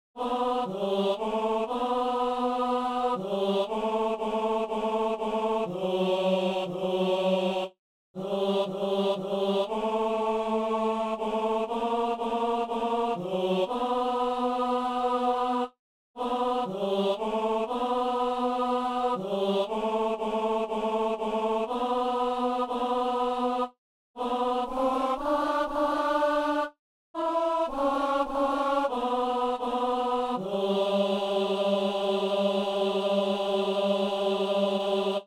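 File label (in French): ténor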